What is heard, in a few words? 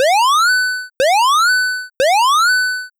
alarm